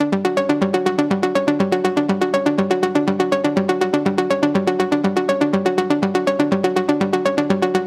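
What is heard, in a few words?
122 BPM Arps D major B minor Tonic F; arp essentials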